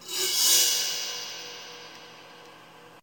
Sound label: Jazz Cymbal Scrape